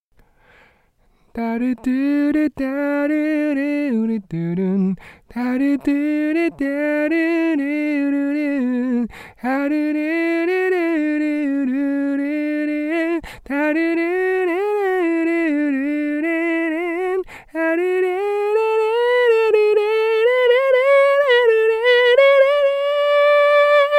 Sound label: vocal